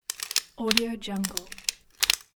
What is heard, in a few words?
pepper mill; sweet finger